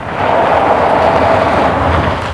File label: SKID; mtm2demo